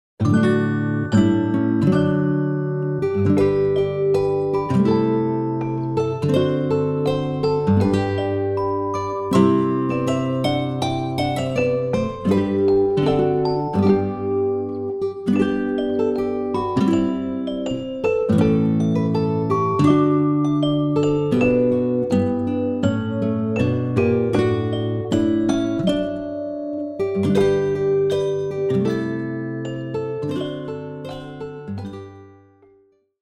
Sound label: carillon